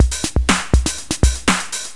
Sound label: D-Kit